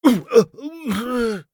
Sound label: scav death, voice; Escape from Tarkov; scav6